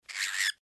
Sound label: cockatiel